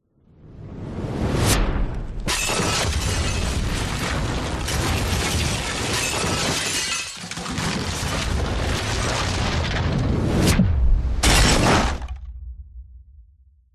explosion shockwave appraoch causes building collapse